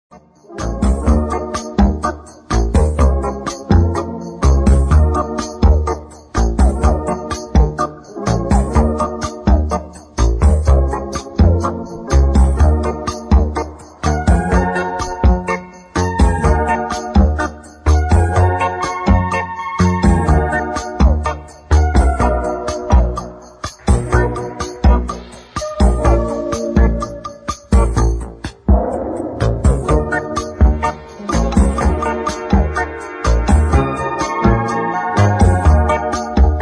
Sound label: Instrumental